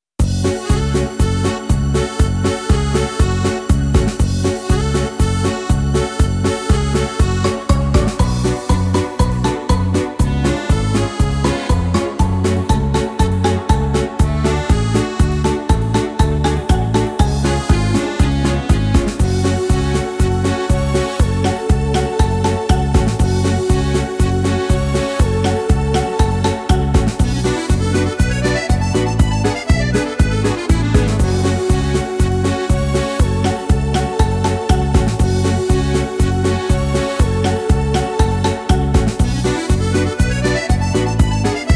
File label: instrumental; Особенности национальной маршрутки (2014)